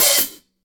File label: reverb open hat one shot d key